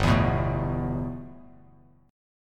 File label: G 6th Add 9th G Bass Standard, chord; G6add9 strummed